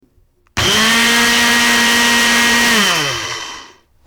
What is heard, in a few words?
Blender